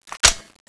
clip out; tfa cso; ultimax100

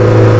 mid, alfa romeo

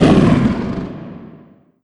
pain; cyberdemon

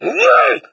attack, hazmat zombie; doom3

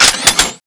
missile ready; tfa cso; magnum lancer

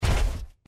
run, power armor; clothing system

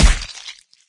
pain head no vo; doom3

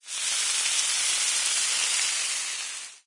tick burn; doom3

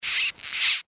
giant rat pant; npc

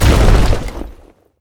thud

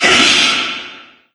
chomp; doom3; forgottenone

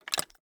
arrow load, bow; bow deerhunter

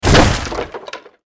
down

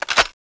clip in; tfa cso; destroyer

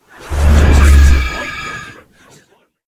attack

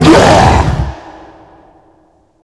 shout; glasgavelen

